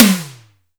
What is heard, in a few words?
sfx perc